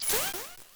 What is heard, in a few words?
Volcano Rock; Wii